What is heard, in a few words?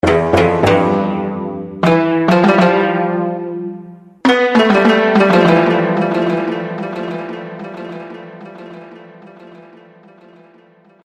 beste sms tone